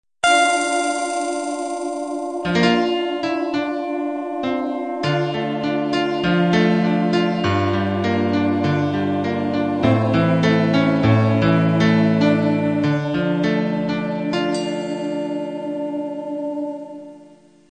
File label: country-pop ballad 1 end